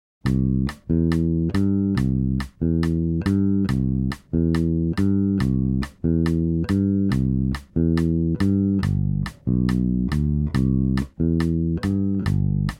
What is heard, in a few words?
Beat & Variation, Bass Unlimited